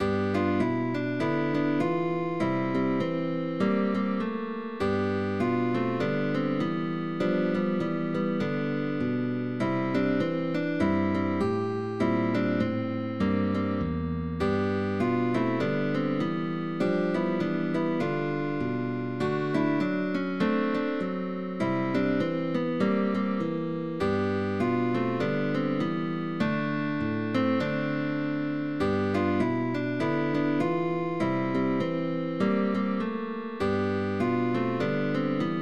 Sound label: BLUES Guitar Quartet, Cuarteto de guitarras; Partitura GRATIS para cuarteto de guitarras. Blues muy fácil; Trasteando "Blues"